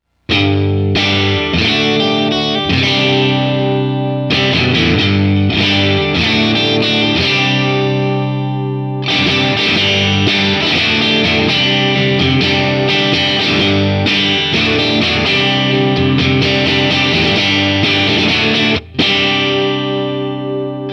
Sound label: FAST CHORD